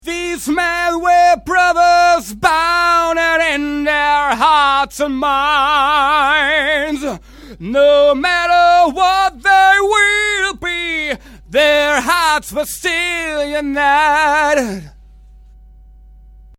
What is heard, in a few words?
Tone